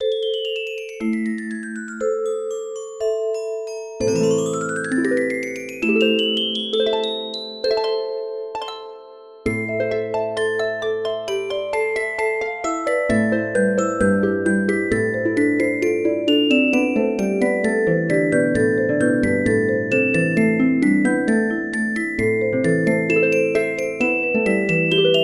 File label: Harp Arpeggio